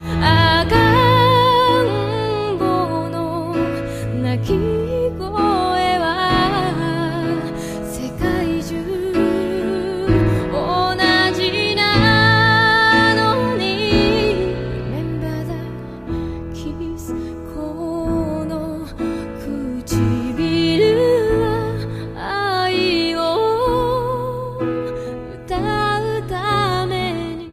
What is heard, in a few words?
duet & Piano